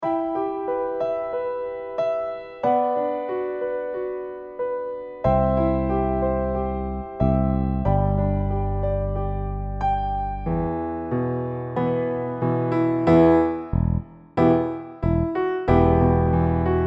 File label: bass slower 92bpm; GHiBLi